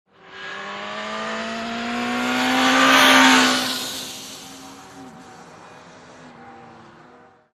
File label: Ring motorcycle passing by; motorcycle racing